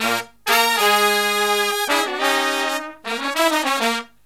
Killer Brass Riffs

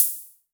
Hi Hats Open; LIMAT Div Dept

